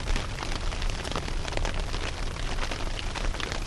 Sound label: Weather, On The Glacier